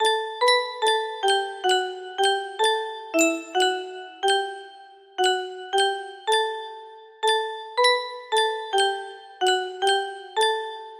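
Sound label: london bridge is falling down, music box melody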